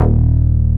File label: MOOGY BLUES, BASSOUNDS; Masterbits; Dance Trax